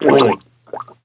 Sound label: uw choke; SINDEMO; player; blade